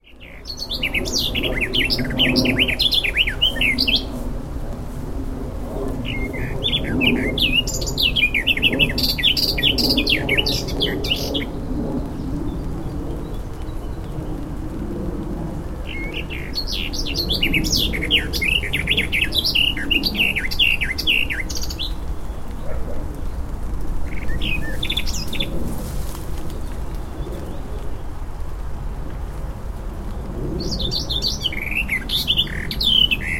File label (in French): Fauvette des jardins; Ile Saint Germain